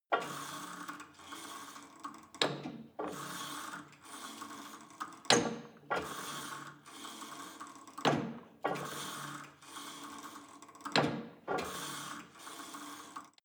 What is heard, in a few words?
cable machine old pull and drop sequence soft; sport freizeit